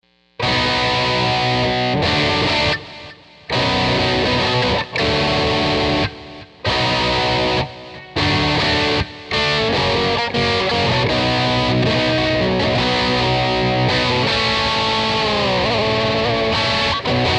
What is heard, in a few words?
electric